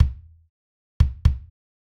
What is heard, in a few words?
kick original